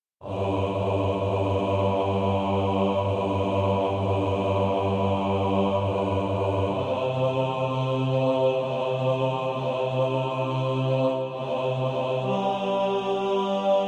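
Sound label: bass, practice music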